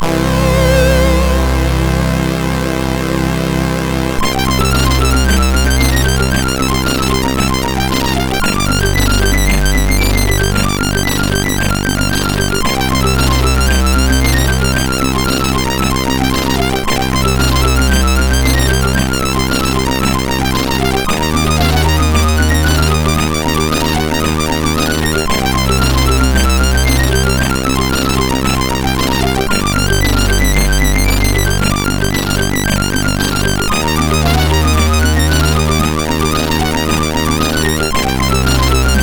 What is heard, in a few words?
E-Load loader, MOS6581R4; hvsc